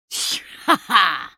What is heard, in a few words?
spell cast effort, en US; Elise